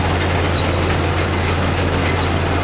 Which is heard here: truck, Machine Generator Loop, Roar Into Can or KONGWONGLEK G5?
Machine Generator Loop